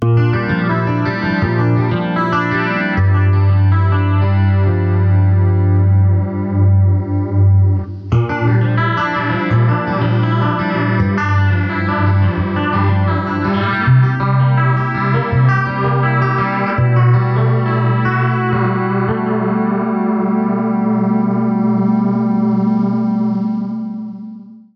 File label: Chorus Variable Depths; Eddy